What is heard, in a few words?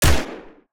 Action Gun Shot